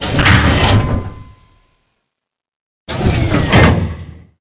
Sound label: mecha spawn